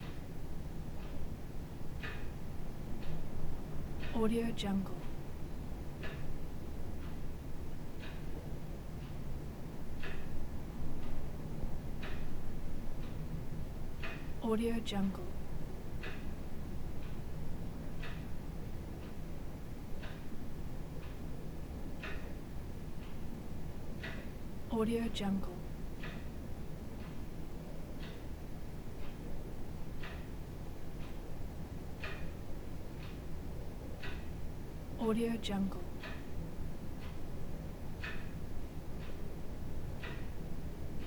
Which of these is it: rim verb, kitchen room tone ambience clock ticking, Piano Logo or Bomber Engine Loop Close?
kitchen room tone ambience clock ticking